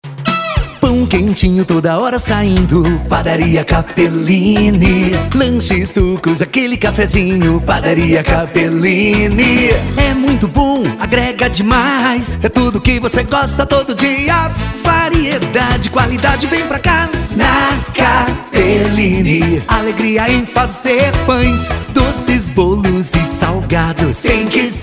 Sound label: jingle